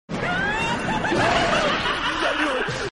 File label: Crazy Laugh; Faust